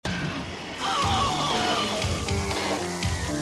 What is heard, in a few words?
beat scream; Mario 64